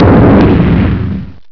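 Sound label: EXPLODE, Explosions; sound effect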